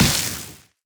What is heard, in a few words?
aether medium; action; impact